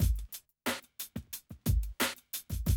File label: Classic DrumLoops